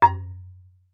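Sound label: Marimba deadstroke fortissimo Gb4; MIS Pitches - 2014; Percussion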